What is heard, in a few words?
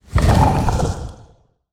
action morph